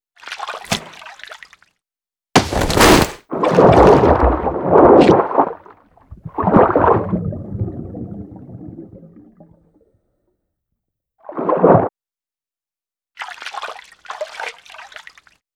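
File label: player water kill mono; Modern Warfare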